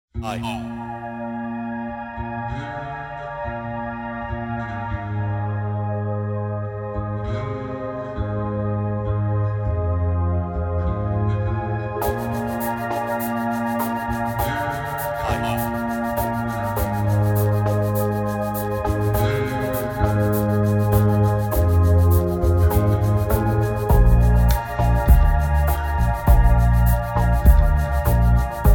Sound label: Happy Bass